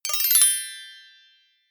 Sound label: notification; samsung Aleart